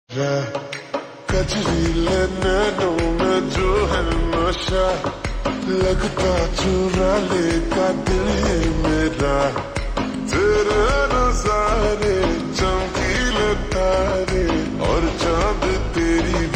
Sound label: Ringtone Slowed Reverb